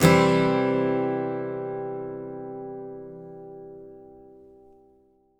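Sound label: acoustic guitar C major; Sampleheads - Whole Lotta Country 1 & 2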